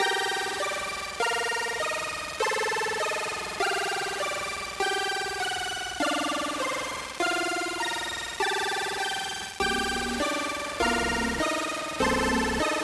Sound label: Spaceshooter (Alternate) (Minigame)